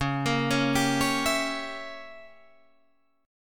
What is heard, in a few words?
C sharp Diminished 7th C sharp down Guitar Drop D